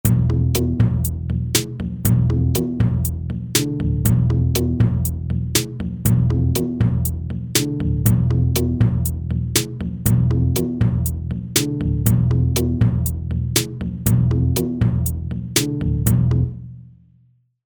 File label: TripHop